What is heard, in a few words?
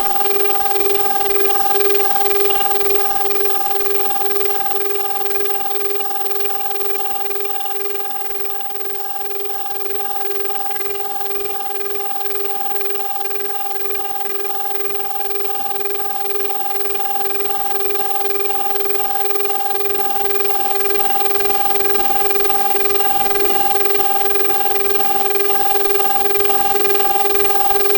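firing a nasty large laser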